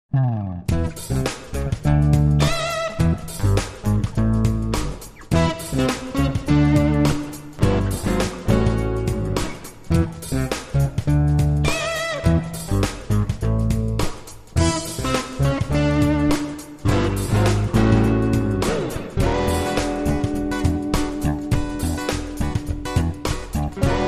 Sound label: Bluesy Groov